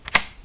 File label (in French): serrure